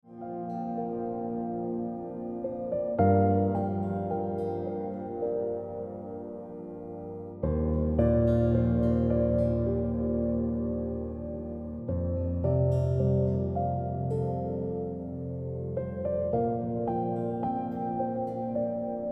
Ringtone

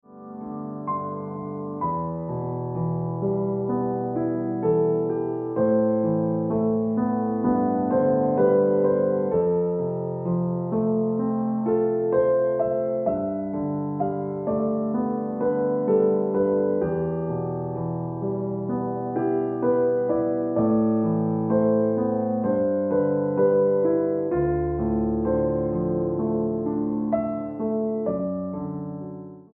Early Sunshine Ringtone Piano Music

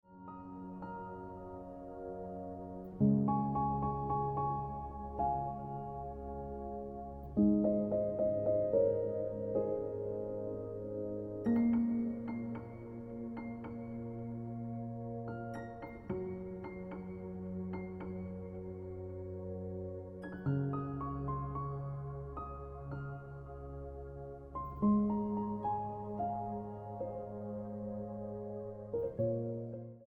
Celestial Ringtone

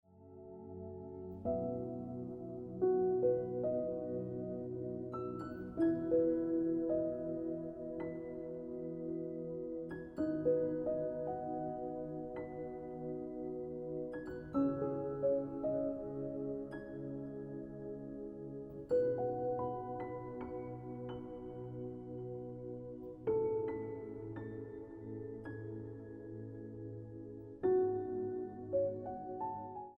Looking Back Ringtone Piano Music

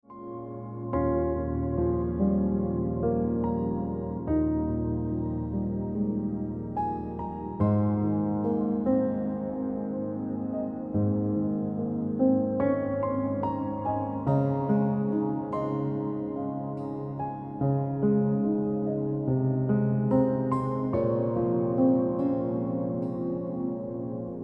Piano Music

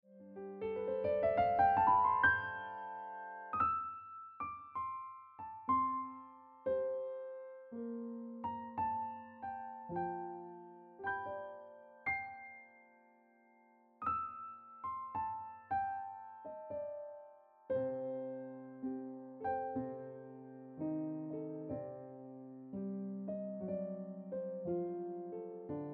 Cool Breeze Ringtone Piano Music

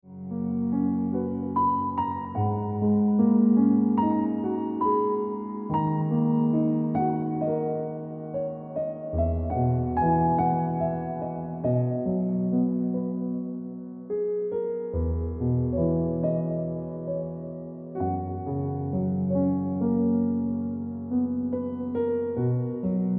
Ringtone

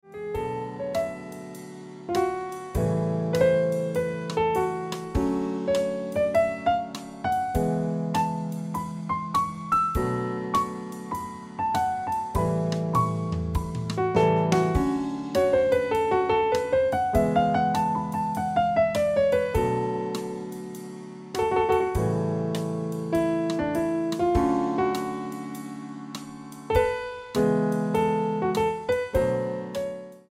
Ringtone

